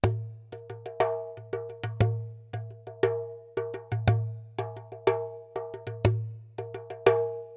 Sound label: Jembe